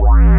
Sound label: sub blart; Basses